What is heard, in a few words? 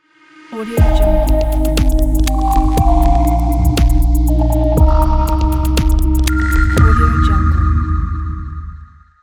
digital elegant logo